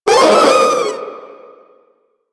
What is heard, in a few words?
Proto Infested vocal short attack